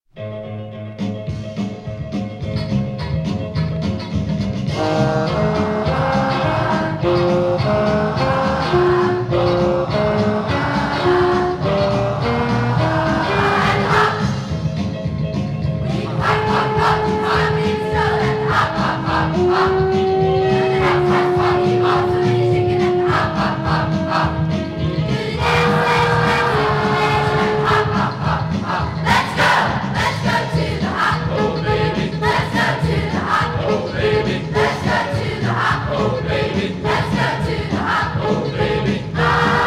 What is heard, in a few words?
Junior High Chorus; Lebenon Junior High Chorus